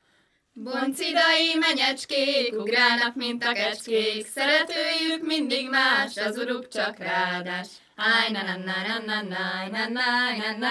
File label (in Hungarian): nepdal